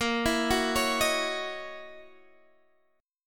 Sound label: Gb 13th Bb, guitar